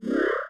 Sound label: teleport; burutaru; android